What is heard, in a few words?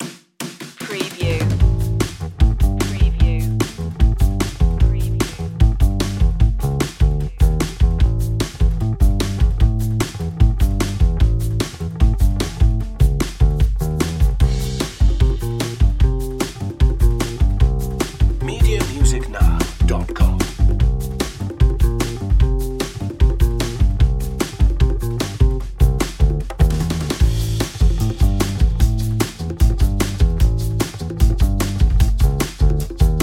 funny fight drums and bass; brisk